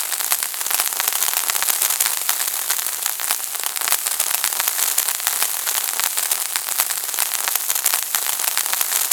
ambience disaster fizz

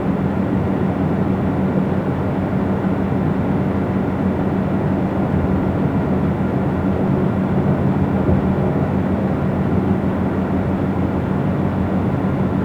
ambience vent

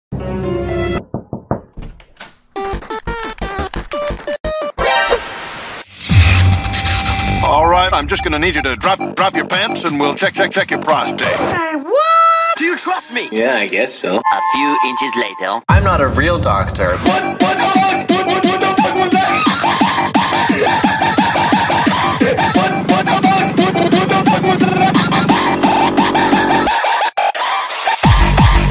ambience

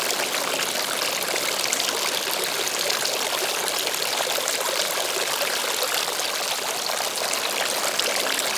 ambience disaster water

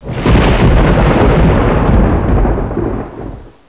thunder

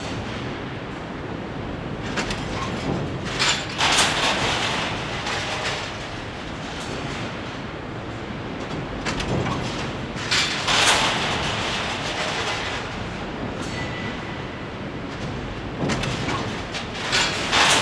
ambience industrial

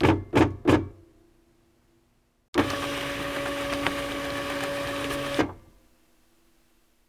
ambience print

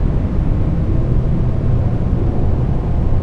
interior

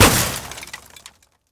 crash

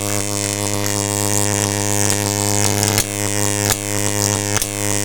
ambience electrical

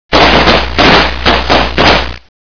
firecrac

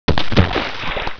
fall scream

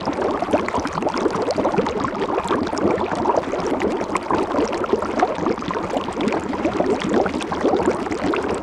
ambience water bubble

